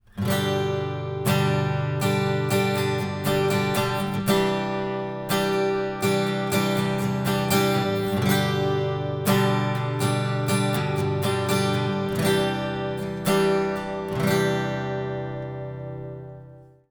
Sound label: at2020 stereo mix, akusticheskoy gitari